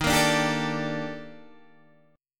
D sharp Major Sharp 11th D sharp Guitar DADGAD, chord; D#M#11; strummed; 13,13,12,12,12,12; chord shape